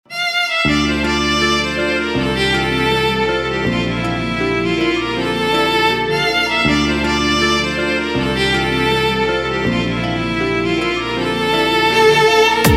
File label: Instrumental Ringtone; Bollywood Hits (2014)